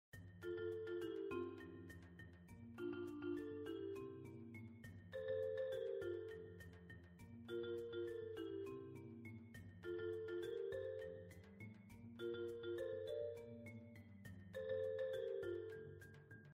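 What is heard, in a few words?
hawaii background music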